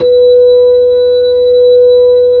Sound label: B-3, Hollow; Keyboards of The 60's and 70's